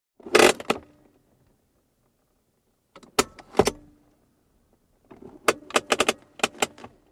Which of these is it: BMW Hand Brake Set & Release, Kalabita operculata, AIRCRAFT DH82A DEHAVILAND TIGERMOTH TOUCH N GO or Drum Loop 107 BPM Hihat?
BMW Hand Brake Set & Release